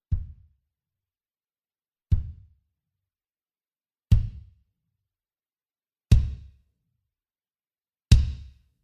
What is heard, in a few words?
KICKS